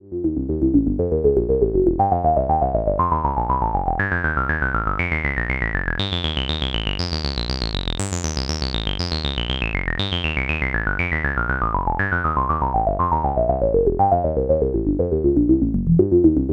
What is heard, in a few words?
lopass